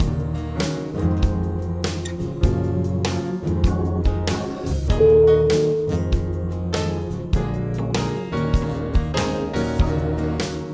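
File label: easy morning jazz, music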